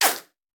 Bullet Ricochet; Sound Ideas; Guns

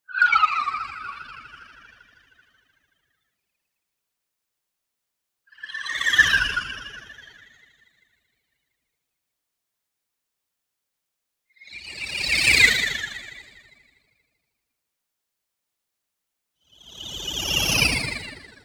WHOOSH Tonal Excitement Pass By; boom library; Anime Essentials

